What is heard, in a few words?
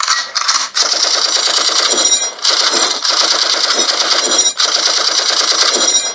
Dawn chorus in Syria